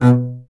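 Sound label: STR CBS, Solo Instruments, double bass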